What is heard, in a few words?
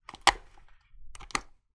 household fan desktop rotation toggle switch